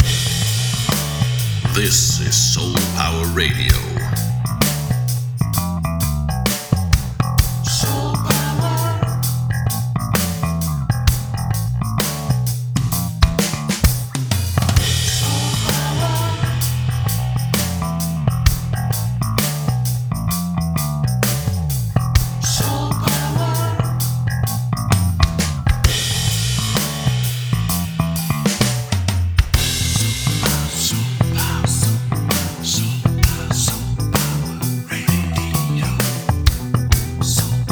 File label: SOUL POWER GROOVE